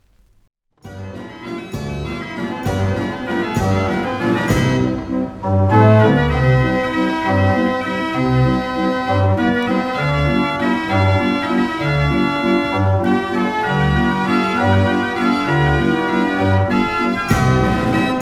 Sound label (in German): schneewalzer, draaiorgel; draaiorgel de turk capriola